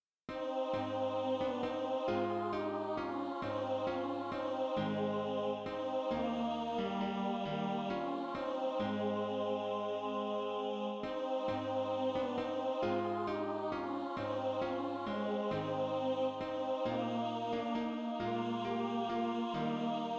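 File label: bass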